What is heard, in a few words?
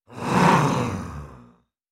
Monster Frankenstein; sound-effects-bible